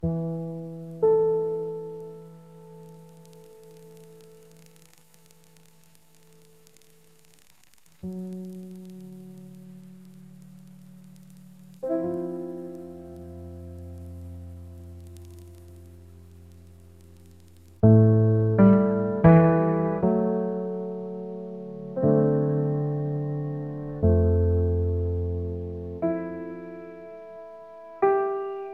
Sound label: rain hits high glass; sleep strings glass conservatory